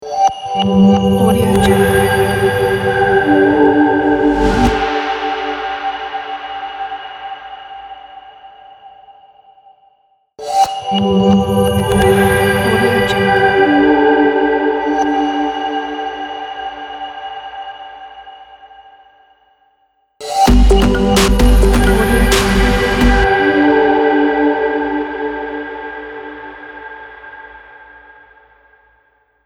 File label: future logo ident